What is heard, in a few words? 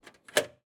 Police Ford Transit Van Cell Door Lock Open, UK Police Vehicle; InMotionAudio